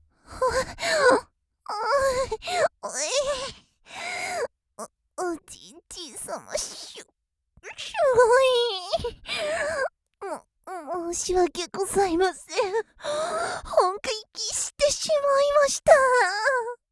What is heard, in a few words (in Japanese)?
はぁっ はぁっ あひぃ…